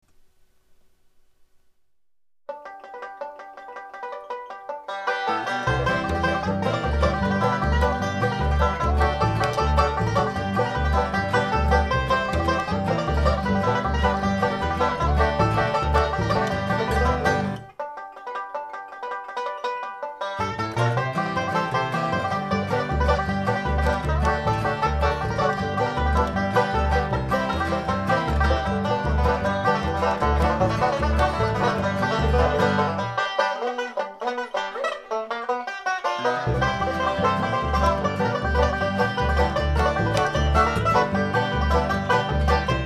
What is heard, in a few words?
bugle call